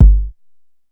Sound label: KICK, one shot drums; App Inventor Media